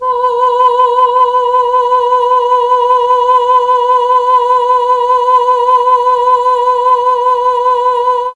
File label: B2 FEMALE, mellotron; CHMB FEMALE